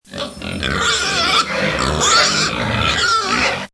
cochons

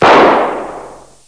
paf; MOBICLIC41

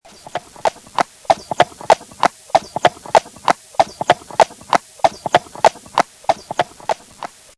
CHEVAL

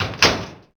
Fondeuse; alone in the dark 4

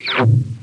cracheur; Arc En Ciel Et Le Lagon Merveilleux; French

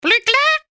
Perroquet; MOBICLIC 72; CPLUSCLAIR

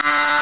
echec

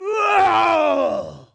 cri

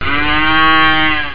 Vache

